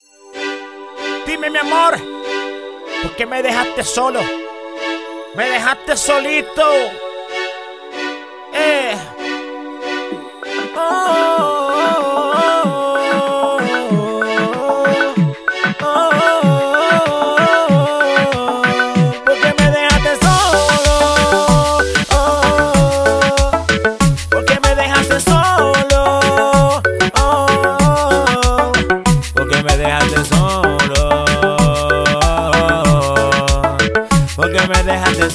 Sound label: Kit D Full Loop 95BPM